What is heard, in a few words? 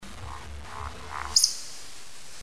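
woodcock; Краткие сведения об основных охотничьих животных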